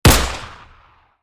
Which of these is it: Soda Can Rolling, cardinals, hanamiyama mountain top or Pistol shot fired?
Pistol shot fired